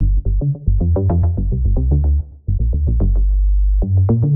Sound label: 110 Cm Sub Funker, Analogue Bass; Loops; Touch Loops; CosmicFunk